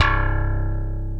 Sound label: SLAP BASS C2; Greytsounds; Scott Peers Sound Engineering